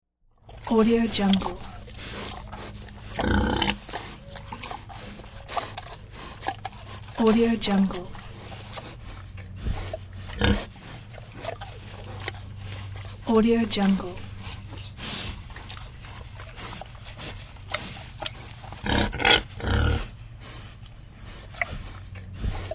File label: pig eats